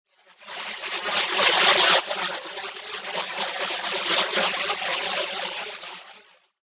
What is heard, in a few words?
Liquid Alien Chaos, alien sound effect; science fiction sound effect; Andrew Fleming; Twistedtracks